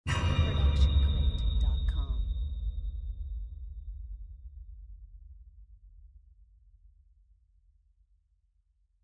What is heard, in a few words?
sword explosion; soundscrate